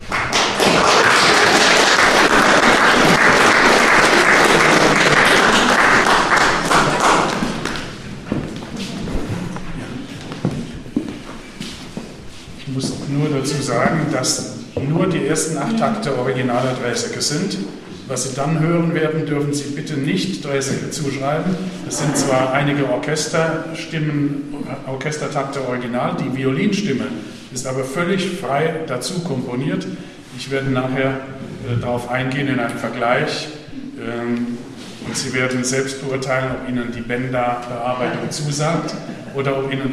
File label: Violin Concerto intro; Draeseke